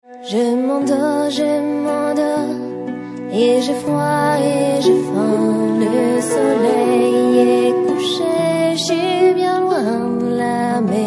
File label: whistle; low whistle en accompagnement